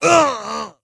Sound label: wound; Skadi The Ruthless